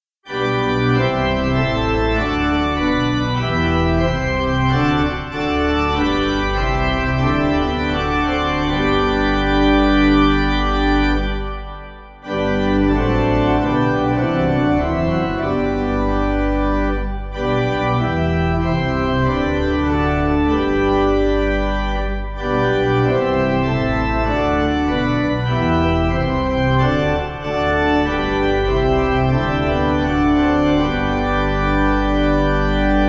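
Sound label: pipe organ, hymn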